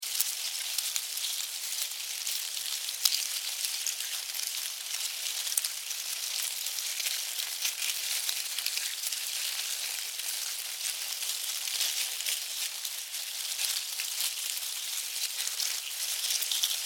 Animal, Termites, Hive, Eating, Movement, Insects, Bugs; Surround Sound